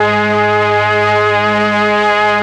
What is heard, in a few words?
STR, STRING